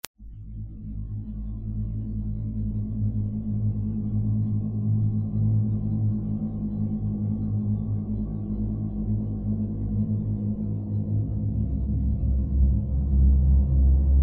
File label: crystal waves